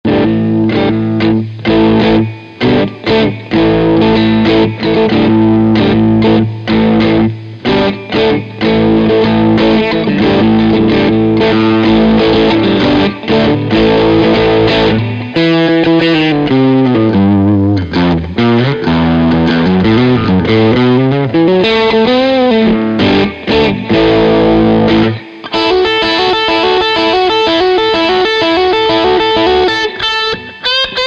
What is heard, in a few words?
snooker theme cover guitar only